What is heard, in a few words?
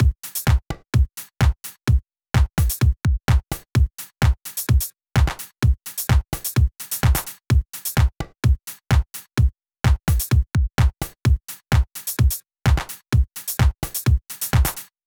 CRAPBEAT